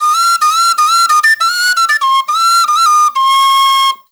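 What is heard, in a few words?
PENNY WHISTLE; Spectrasonics; Heart of Africa 2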